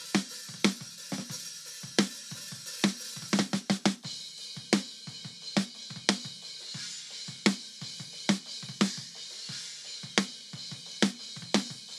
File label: Snare really loud cymbals deBleed Off